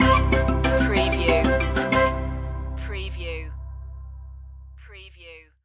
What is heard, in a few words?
african spirit stinger; flutes